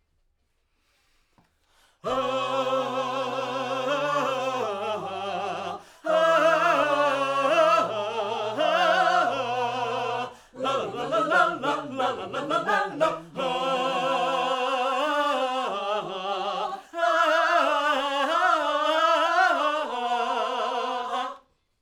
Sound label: Backs SCHOEPS